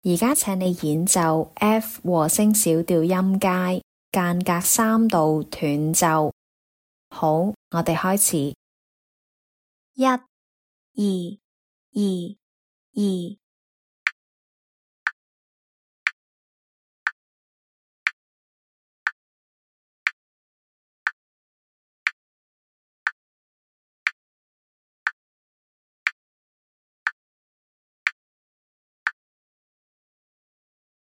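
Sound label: F minor harmonic scale 3rd apart staccato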